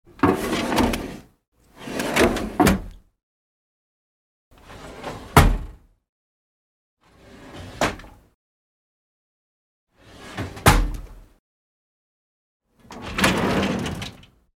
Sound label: household cabinet drawer open and close; sound effects one